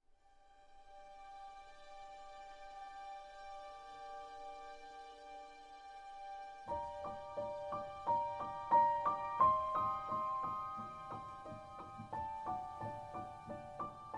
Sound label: Instrumental